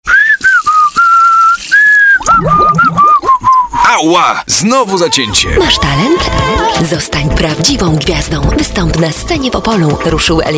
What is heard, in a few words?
karaoke; Festiwal Opole